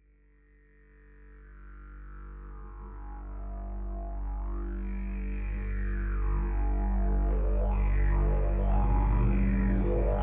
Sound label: Didgeridoo; Show Opener; Logic